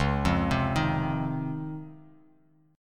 C sharp Major 9th C sharp, Bass Standard; C#M9 arpeggiated; JGuitar

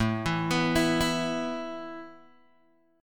A Suspended 2nd A Guitar Open G

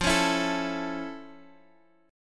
F Augmented Major 7th, Guitar, Open D; 15,16,14,15,x,x

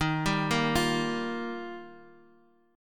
D sharp Major Add 9th; 8,7,6,8; 4G,5C,5E,5A; chordshape

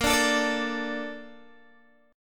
Bb Major 7th, Guitar, Open D; 20,17,19,20,17,x

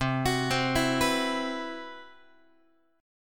C Major Sharp 11th, Guitar; CM#11 arpeggiated

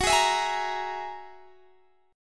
G sharp 7th Suspended 2nd Sharp 5th

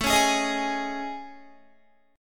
A Major Sharp 11th, Guitar; AM#11 strummed

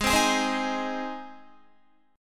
Ab Major Ab down Guitar; strummed; JGuitar

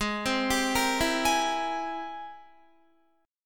Ab Augmented Major 9th Ab Guitar; arpeggiated; Ab+M9; JGuitar

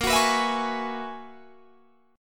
Gb 11th Bb

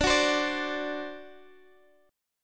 D 7th D down Guitar Open G

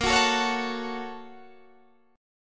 Bb Minor Major 7th Sharp 5th, Guitar, Open D

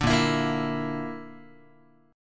Bb Major Flat 5th, Guitar; 5,6,7,8,8,x; 4A,4E,5A,5D,5G#,6C#; strummed

